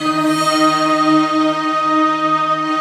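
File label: Chinese orchestra; Optical Media International; Sonic Images Library; Sweep Combi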